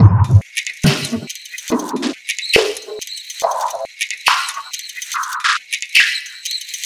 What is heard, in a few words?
Vinyl Loop; Sonic Foundry (Sony Creative Software); Esoterik Beatz; Vinyl101